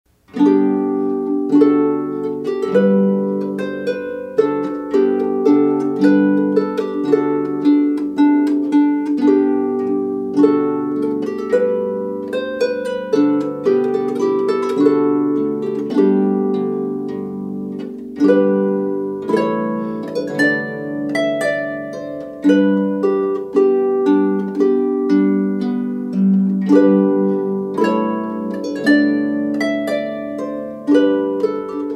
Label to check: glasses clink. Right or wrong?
Wrong